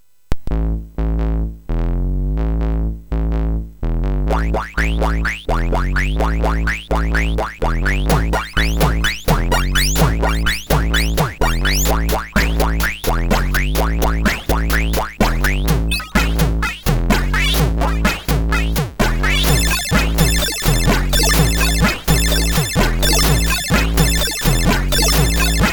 MOS 6581; MOS6581R4

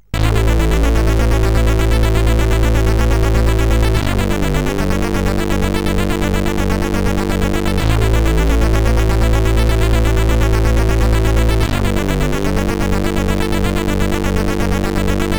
Spacesong, CSG8580R5

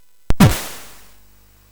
Blob, MOS6581R4; hvsc

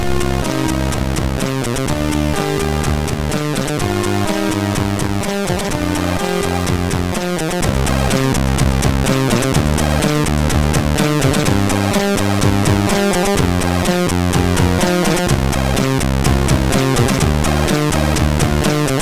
Funky Freak remix, MOS6581R2

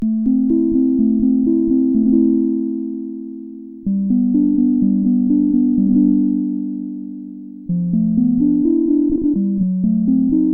CSG8580R5, 8BitChiptune

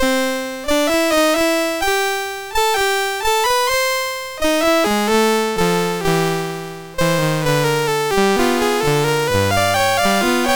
Ragtime, MOS6581; MOS6581R3

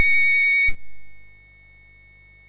sid MOS6581R2, GAMES; Face Off

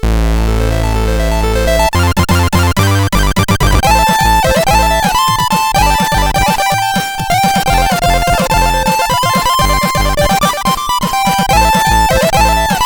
tune, CSG8580R5

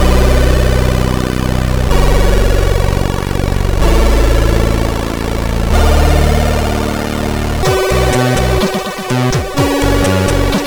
Scrollie Times tune, CSG8580R5; Sudden Death

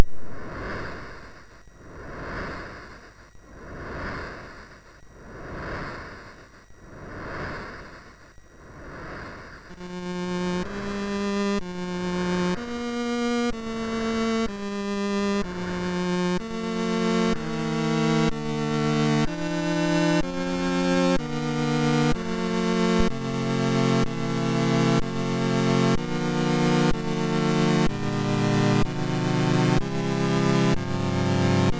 Beach, MOS6581R2

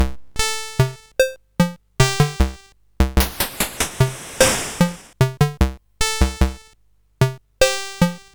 sid CSG8580R5, GAMES; Classic Concentration

